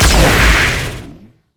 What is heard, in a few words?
explode; LoL; velkoz skin01